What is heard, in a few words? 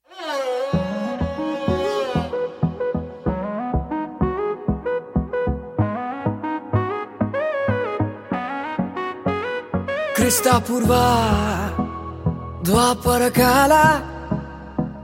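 background music ringtone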